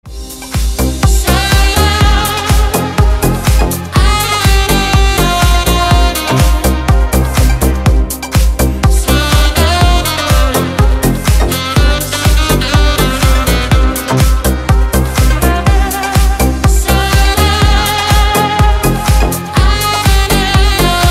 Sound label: sax cover; саксофон